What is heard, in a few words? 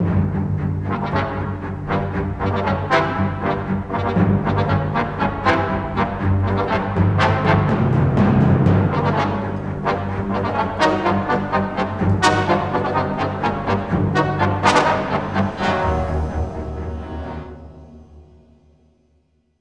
tension, music